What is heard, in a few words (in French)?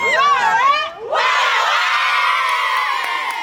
cri de guerre